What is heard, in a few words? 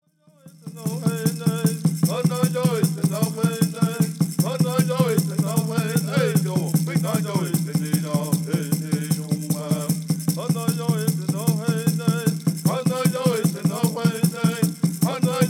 Peyote Song